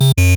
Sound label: wrong